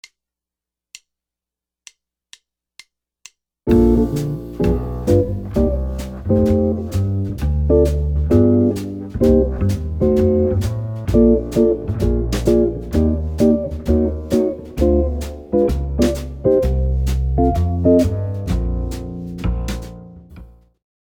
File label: rhythm changes, jazz guitar